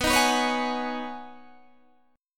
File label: B 6th Add 9th B Guitar DADGAD, chord